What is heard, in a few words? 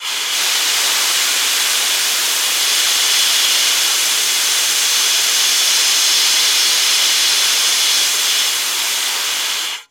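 Steam Whoosh Release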